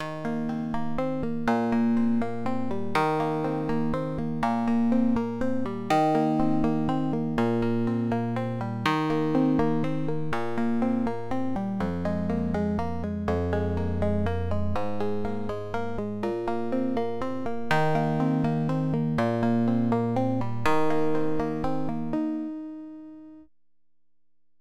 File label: Plucked String Modelling; ReaRack